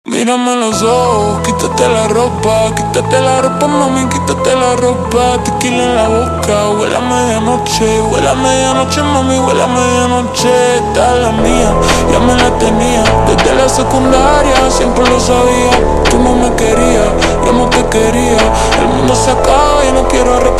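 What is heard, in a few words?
ultra Slowed, phonk music; funk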